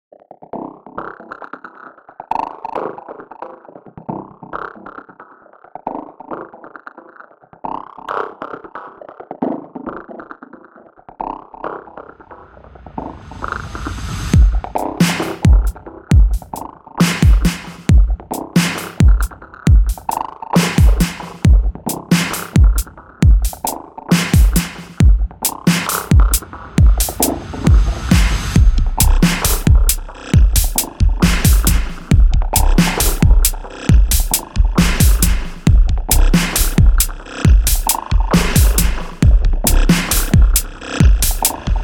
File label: Frogs